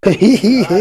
Evil Laugh; BaD Radio; Brett Hull

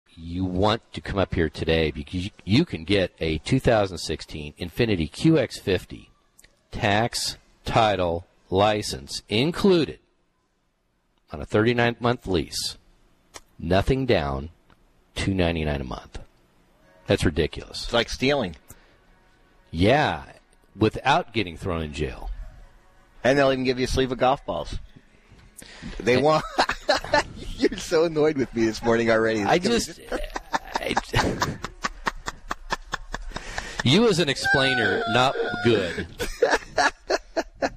death laugh

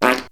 fart

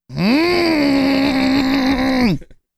grunt

